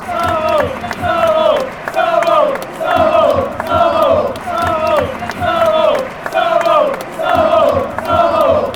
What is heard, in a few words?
dundee chant, fanchants